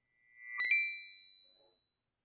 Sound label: logo star respawn; riviera Star; Fantasma